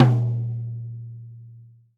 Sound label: Tom Med; hydrogen drum samples